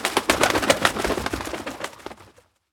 raven takeoff

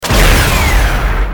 missile launcher shot; FoS weapon sounds; FoS SFX

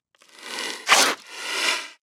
radscorpion attack tail

